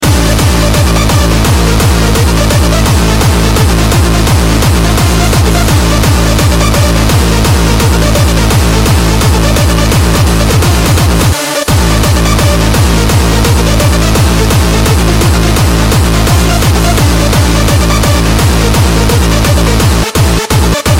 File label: Hardstyle Scream